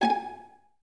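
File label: Violas G#5 pizzicato, String Ensembles; Miroslav Vitous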